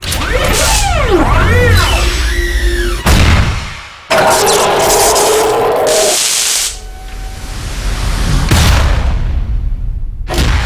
Boss Saurs Dead; Super Monkey Ball Banana Blitz HD

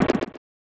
Hit

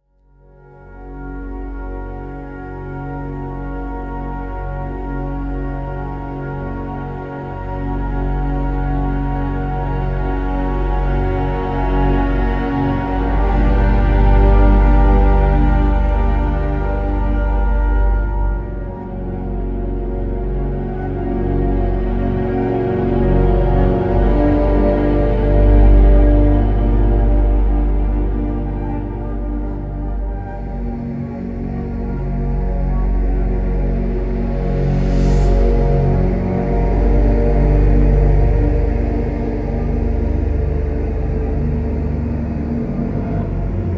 storm music docks alt ambient; gamerip; Heroes of the Storm

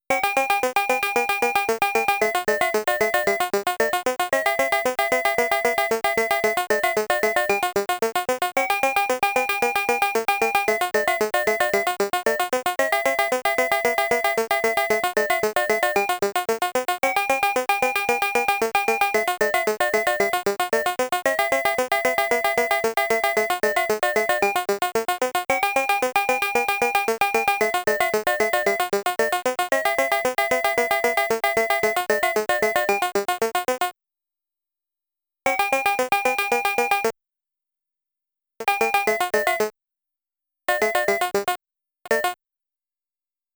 In-Game Music; Dig Dug

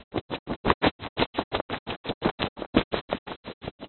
Fluttr; Buggy; PS1

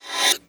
Messagebox In

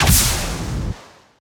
Gunartl Rocket Launcher Fire

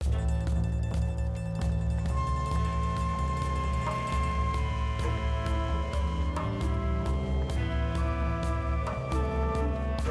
Swamp; Avatar The Last Airbender

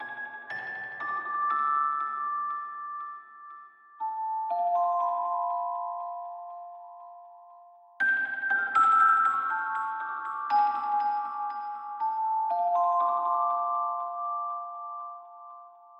celesta; Toki Tori Collection

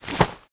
Wing Flap; City

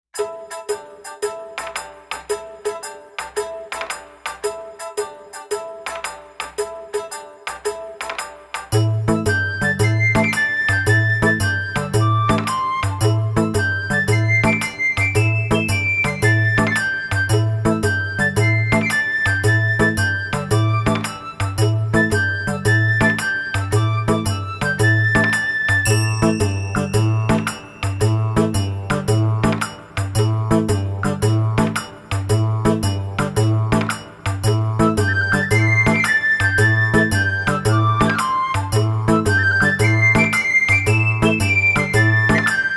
From Afar, A Japanese Orchestra Can Be Heard; Yokai Watch

